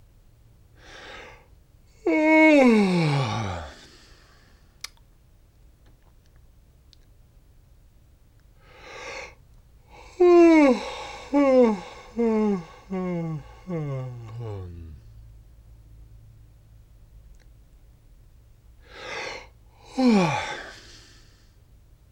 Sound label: Yawning